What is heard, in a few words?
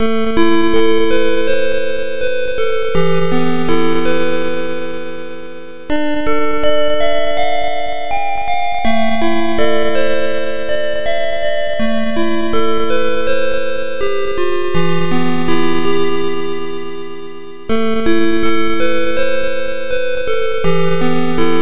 autumn rain